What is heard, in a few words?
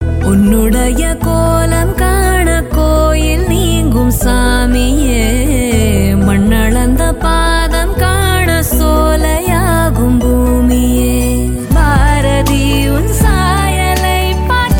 love tamil ring ringtone